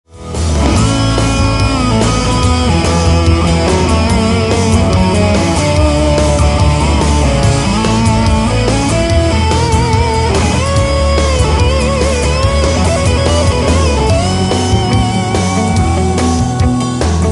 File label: (guitar solo) ringtone